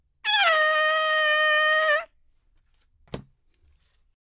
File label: lil airhorn